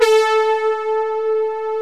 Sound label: A4, SYN ANA5; Best Service Gigapack 1